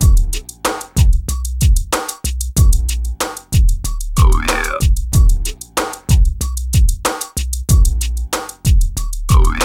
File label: BEAT, 93-96bpm; PlatinumPhattCD1; E-MU Producer Series Vol. 8 – Platinum Phatt; Beats